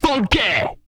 FUNKY; ILIO; Vocal Planet

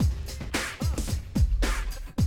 Classic DrumLoops, 110

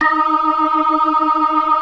ORGAN, 4 DRAWBARS; Keith Emerson Signature Series

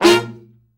Killer Horns, SECTION 7TH; Best Service

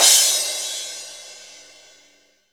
cymbal

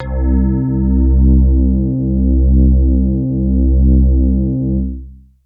Big Synth Basses

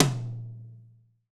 TOM, Roland, Real Kit

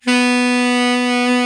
TEN SAX B

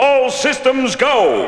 VOX GENERAL; AMG Megabass REMIX! VOL-2

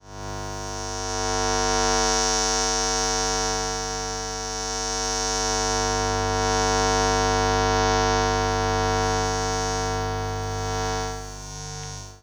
Electromagnetic Fields

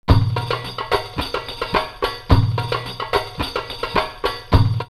Amman 108, drumloops World & Ethnic; X-Static Goldmine

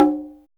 CGA, HIP-HOP; Drums In Your FACE!

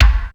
Ambient Kick, Ensoniq ASR-X Pro

